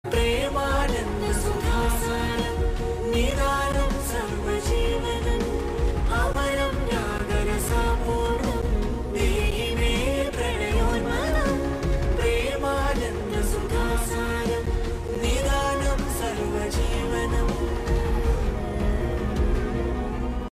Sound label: telugu love bgm; miss shetty mr polshetty